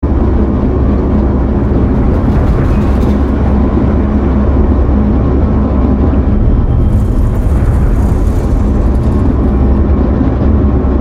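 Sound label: Ambience Train Run Caboose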